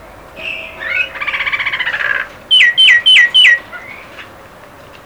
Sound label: song